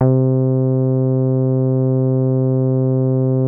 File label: C4, Bass; E-MU Producer Series Vol. 6 – Analogia Project (CD 1); Boom da Bass 2